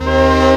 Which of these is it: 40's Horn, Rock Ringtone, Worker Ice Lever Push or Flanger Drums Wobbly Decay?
40's Horn